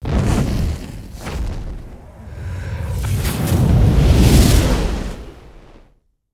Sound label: destruction fire charge; skyrim